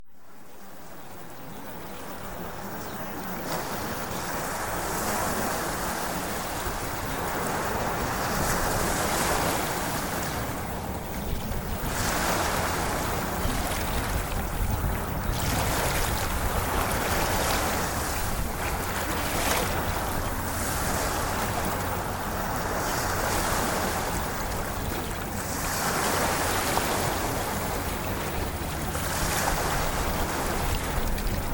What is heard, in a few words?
Ocean Waves; Premium Subliminals